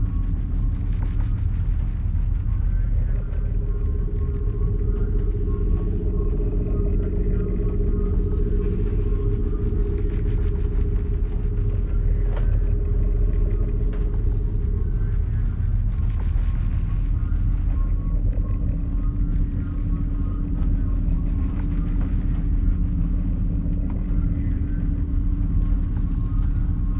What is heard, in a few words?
ship construction ship idle; Stellaris; shroud; ships